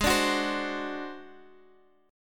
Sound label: Ab 7th Flat 5th down Guitar Open D